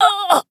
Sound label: death fem, player